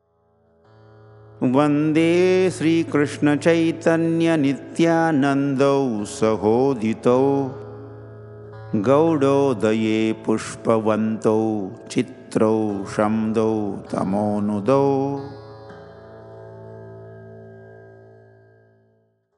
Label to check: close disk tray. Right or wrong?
Wrong